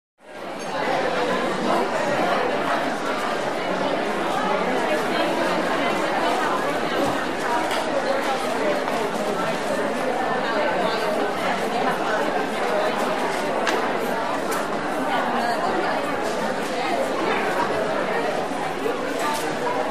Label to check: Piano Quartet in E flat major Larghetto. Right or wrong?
Wrong